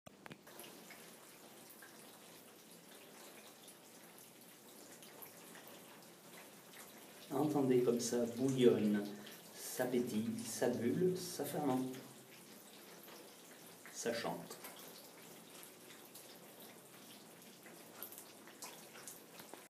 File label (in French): le chant des cuves